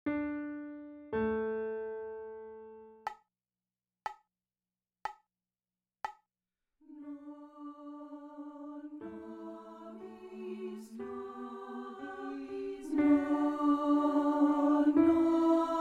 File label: Soprano 2; Non Nobis Domine; Byrd; ed. Battle